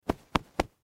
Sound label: Cloth Soft Impact Pat Bed Three Times; Foley